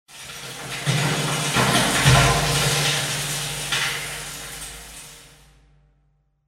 short rock metal; Dystopia; injection

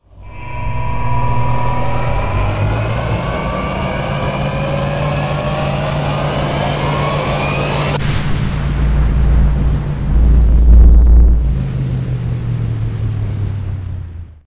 portal; Dystopia; cybernetic

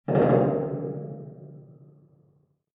metal creak; Dystopia; injection

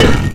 concrete break, physics; Dystopia

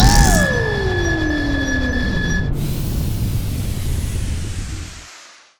generator stop; Dystopia; exodus

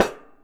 metal solid soft, physics; Dystopia

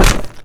fall death; Dystopia

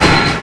metal damage; Dystopia; physics

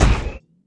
heavy dirt, footsteps; player; heavyfeet; Dystopia

